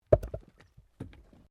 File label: Wood Log Place; Material components for constructing more complex sounds; Wood Sound Effects; Wood (Sound Effects:Materials)